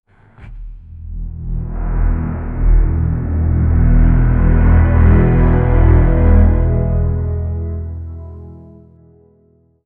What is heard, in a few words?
horror ambience villain